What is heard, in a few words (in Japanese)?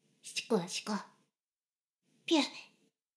しこしこ音声; 牧場戦腺; 少佐殿